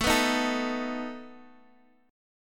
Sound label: A Suspended 2nd Flat 5th, Guitar, Drop D